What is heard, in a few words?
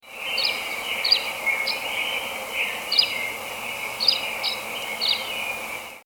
SWALLOW